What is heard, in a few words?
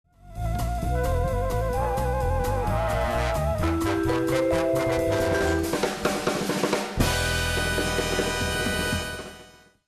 intro jingle